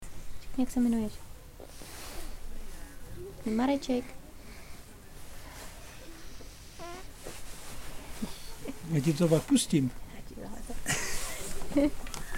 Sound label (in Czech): slavíci